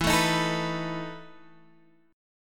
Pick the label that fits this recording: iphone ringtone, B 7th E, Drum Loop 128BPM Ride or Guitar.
B 7th E